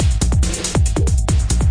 140 bpm, electro thick, drum loops and breaks

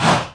"haa", Voice; single hits

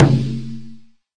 Processed Ethn Perc, ETHNIC and WORLD PERCUSSION

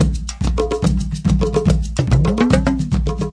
072 tribal with bendy drum, drum loops and breaks; 000 to 080 bpm

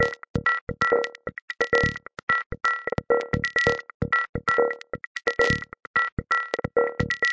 131 rollertechno

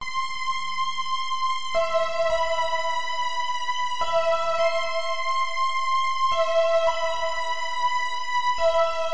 105 electro-string-melody, melodic loops

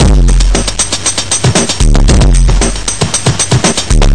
116 heavy distorted, drum loops and breaks

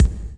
house Kick; Choosy House Kit

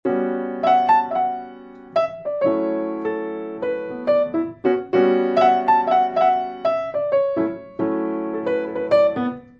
chill piano 100 A13 Dma9; KEYS ORGAN PIANO

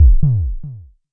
Jomox MBase, drum machine; Jomox MBase 01

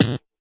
tight hit, Tight n Hard Kit; Hip Hop; STYLE KITS (Hip Hop, Etc)

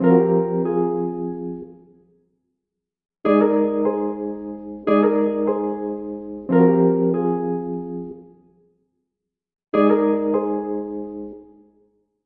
074 plaintive epiano chops; KEYS ORGAN PIANO LOOPS

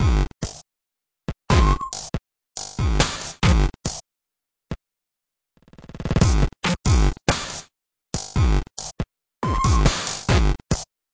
140 crunchy tweaker, drum loops and breaks

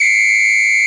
police whistle long; Weird and Interesting Hits; single hits

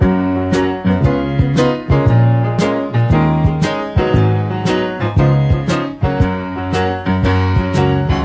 116 piano, sampled music loop; MELODIC LOOPS

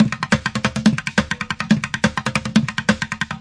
140 loose worldly wood, DRUM LOOPS and BREAKS

